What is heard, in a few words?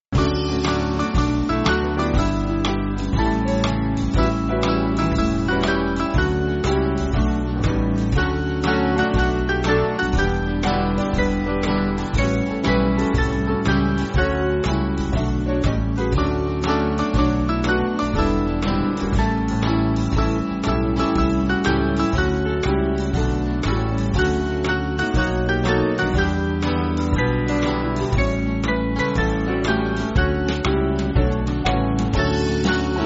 Band